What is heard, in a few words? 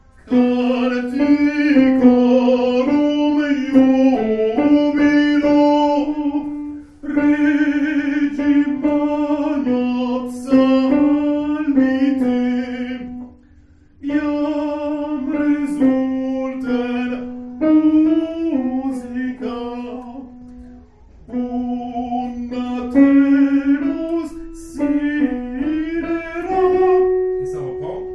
Tenors; CANTICORUM